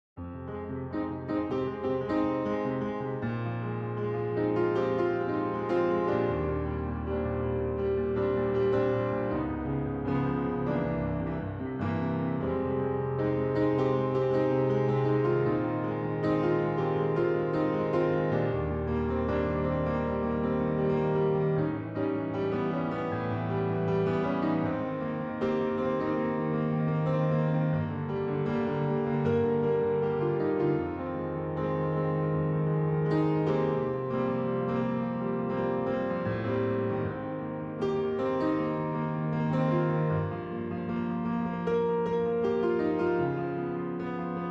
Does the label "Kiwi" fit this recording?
No